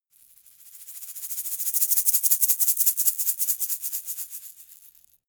shaker plastic egg rattle dynamic

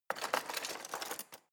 knives wood drawer grab hard

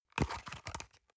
paper box small handle medium

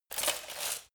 light walk fast single

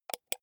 computer mouse wheel click

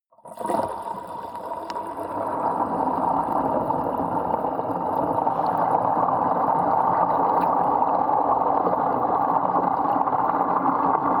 flowing submerged small long

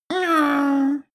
cartoon monster tiny sigh

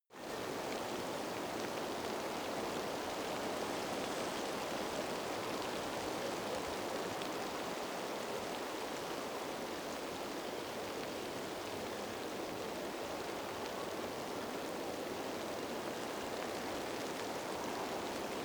forest rain wind light

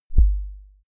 sub kick clean transient

